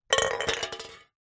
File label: foley cans soda 200ml 2x empty knock over on concrete; zapsplat